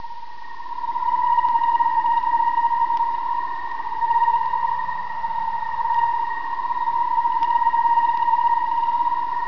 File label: Sci-Fi